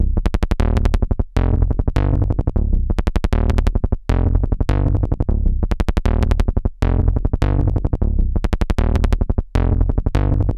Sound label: SYNTHIX BASS, BASSLINES 175; Masterbits; Tekkno Trax